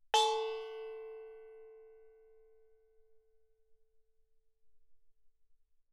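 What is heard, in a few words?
thai gong A4 fortissimo, Percussion gongs & tamtams; thaigongs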